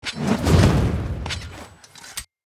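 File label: sword big swing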